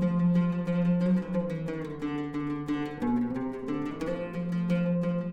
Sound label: Oud 90 bpm